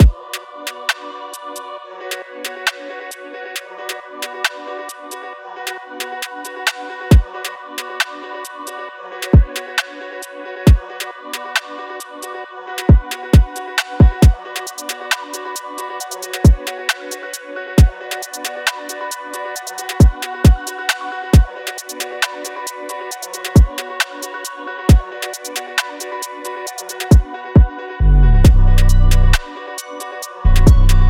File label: Hip Hop Bound; Hip Hop Music Pack Vol. 3